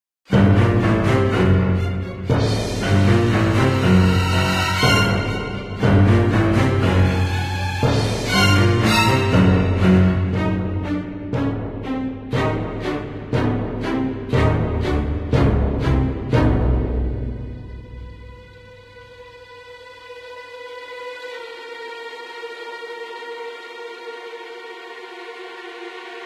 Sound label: Pandemonium, Tension